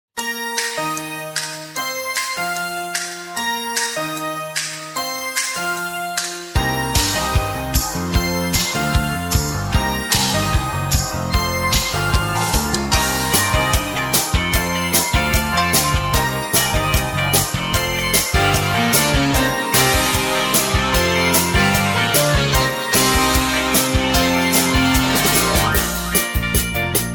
(Bb) No Backing Vocals

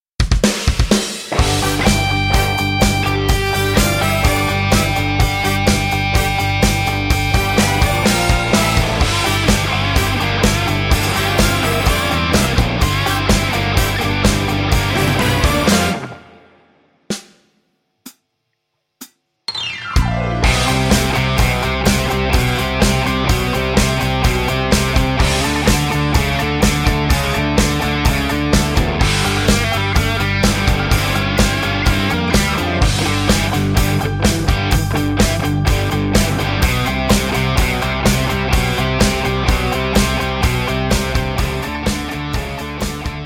G No Backing Vocals

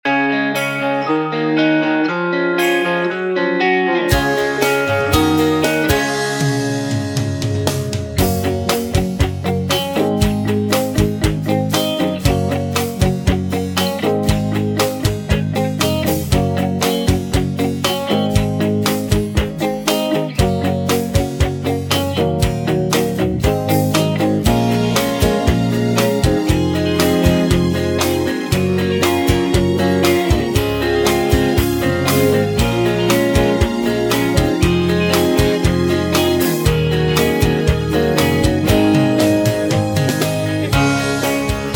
(Db To Eb) No Backing Vocals

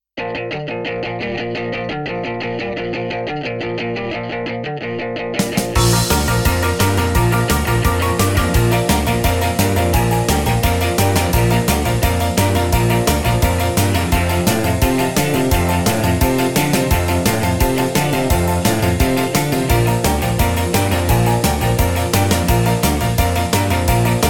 Bb No Backing Vocals

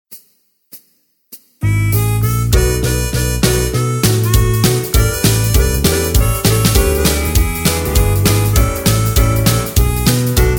Drinking Blues (E) No Lead Guitar

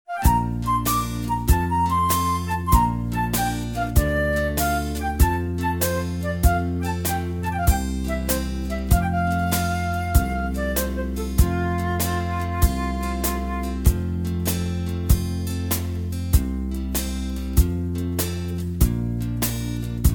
F To G No Backing Vocals

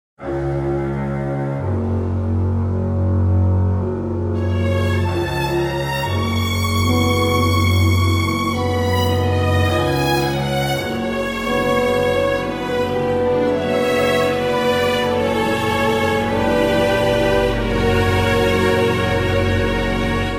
Db No Backing Vocals

